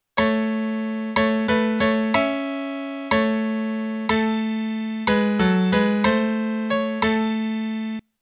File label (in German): melodie; MelodiediktateII